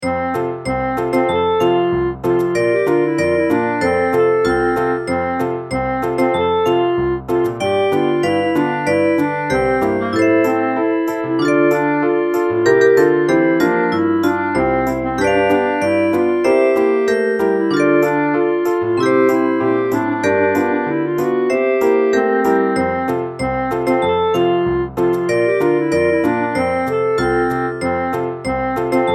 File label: Cartoony TV Theme